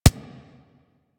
Weapon Punch SMG

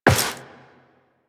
Step Metal, Footsteps, Walk; Warframe; Tenno

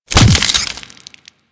Cluster Explosion; Uzi; Warframe; Infested

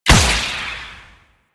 Spike Gun Shot; Enemies; Warframe; Infested; ZealoidPrelate

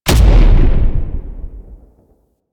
Tank Cannon Punch; Enemies; Weapons; Warframe; Lasrian

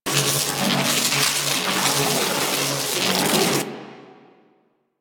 Electricity Loop

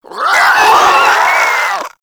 Infested Crawler Death; Warframe

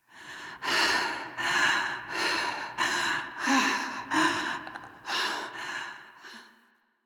Landing Breaths; Warframe; The New War; Cetus Landing

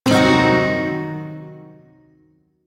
Shawzin Blues Chord; Warframe; Duviri Shawzin

